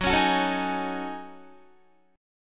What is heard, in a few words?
Gb Minor 6th Add 9th Gb down Guitar; Gbm6add9; strummed